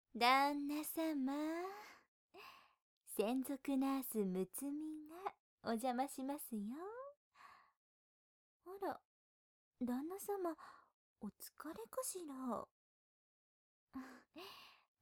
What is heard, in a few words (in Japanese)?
射精を許す耳舐め手コキ（右耳。ナース）; 六海さん; 癒し系専属ナースの七海さん六海さんに愛されちゃうバイノーラル